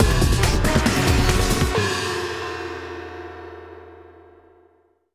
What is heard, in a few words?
Combat END